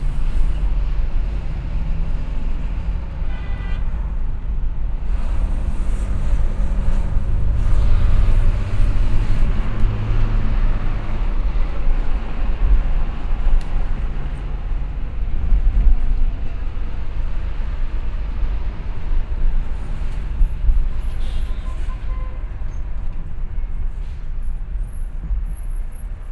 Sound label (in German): Foto-Spaziergang, Brasilien; Fieldrecordings